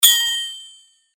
Sword Sound; FXHome; Fighting Sounds

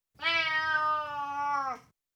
Burmese, Individual Meows, Cats; BBC Sound Effects Library

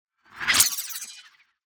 Tiny Transition; SoundBits; Tiny Transitions

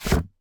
drop Leather; Kenney's Sound Pack; RPG Sounds

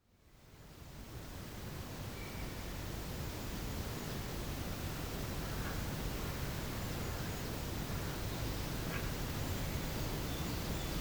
Mixed Woodland Early Spring; Rural Soundscapes; BBC Sound Effects Library

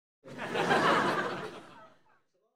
Light Entertainment Audience, Audiences Crowds; Children Crowds Foots; BBC Sound Effects Library

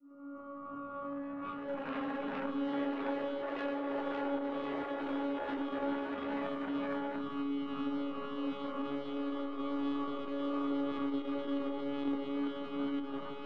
Magnetic Field; 99Sounds; Magnetic Fields

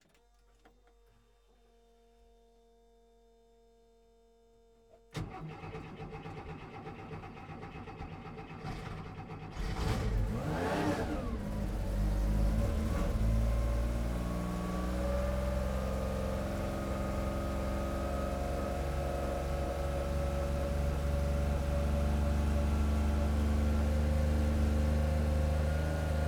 Porsche 911 ST, Onbrd, Idle, Various, Interior; 1972

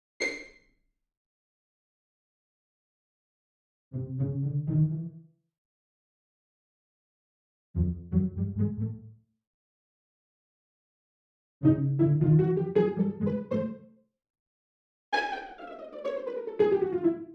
Cartoon Pizzicato; Leadings and Stings

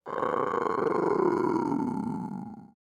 Test Subject, Zombie; Zombie Specimens Vol 2

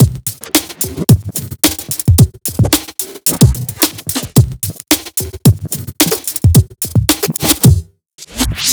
LOOP 110BPM; Sound Design Tools; Loops

